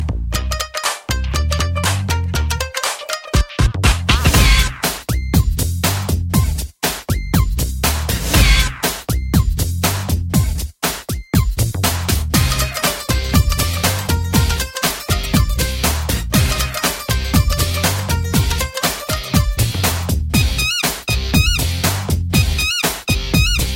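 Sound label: background music Telugu Ringtone; NTR; Rakhee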